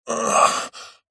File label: human male single breath groan pain ill